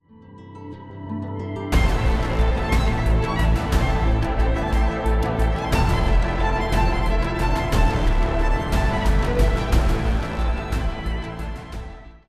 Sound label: Stinger; apex legends season 17 18 ps4 switch windows xbox one gamerip 2019; Ballistic